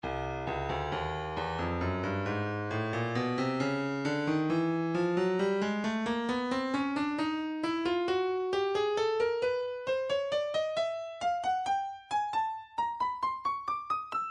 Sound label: breakbeat 067 bpm; alienated; Stylus Breakbeat Midi Files